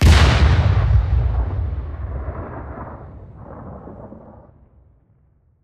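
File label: Reaper Nuke Destruct Explosion Mid Distance; Titanfall 2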